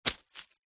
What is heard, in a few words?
single footstep tread on dried leaves; foley; zapsplat